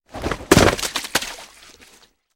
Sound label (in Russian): upavshego tela v grjaz